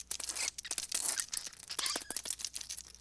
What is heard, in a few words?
rats, ambient creatures; gesource